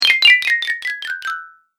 Cartoon Game Fail; Cartoon Sound Design Sound Effects

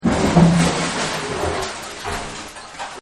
Scrape It Up; Scrapes; Objects

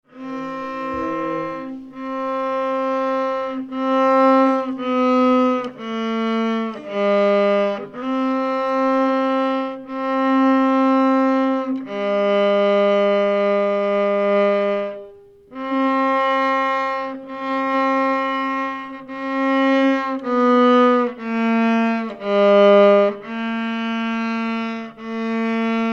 Violin Played By Young Child Learning Tune; Miscellaneous Musical; Tom Hutchings

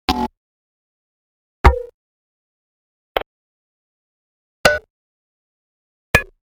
5 Sounds Production Elements, Electronic

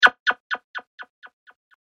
Repeating Laser Sound; Andrew Fleming

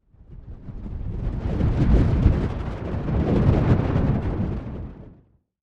Fantasy Winds; Wind Sound Effects; role playing games, magic videogames

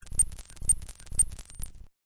SciFi Spaceship Radio Bad Connection; Communication; Weird Alien Noises; Communications; Crafted Signals

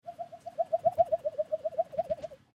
Creature Fantasy Fly Flying; Monsters; Horror; BigRoomSound

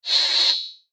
FLASH ELEMENT HIGH TECH

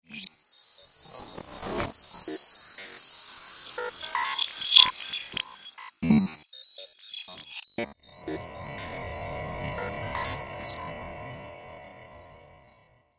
Alien Data Search; Alien Sound Effects; science fiction sound effect

